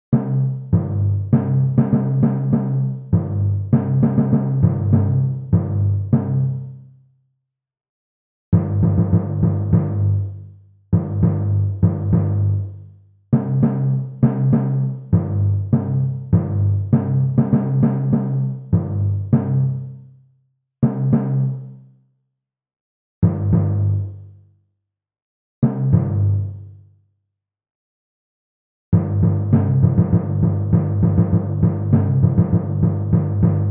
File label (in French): petit duo pour trombone timbales, timbales seules; aubert francois